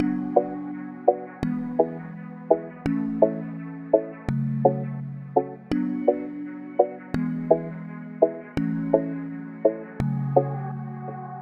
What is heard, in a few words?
Keys F Minor 84bpm